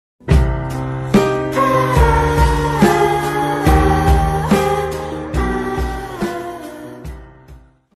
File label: Sound Effect Sad Meme